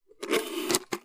Lid is removed from a large drinking cup and straw is inserted through lid. Lid O/C Straw, Insert Open, Close